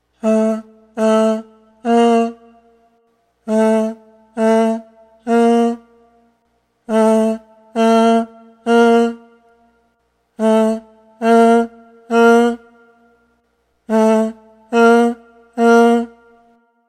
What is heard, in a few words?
voice